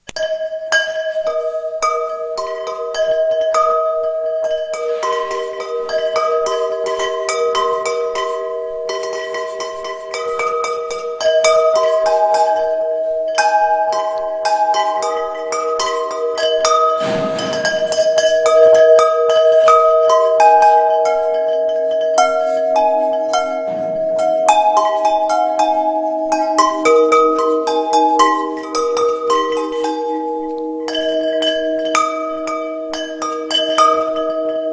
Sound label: without cowbell